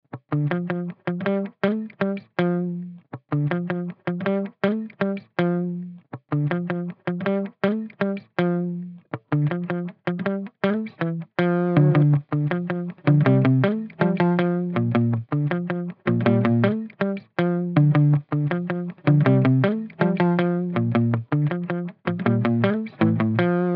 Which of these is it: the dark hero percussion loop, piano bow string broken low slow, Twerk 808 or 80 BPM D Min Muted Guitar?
80 BPM D Min Muted Guitar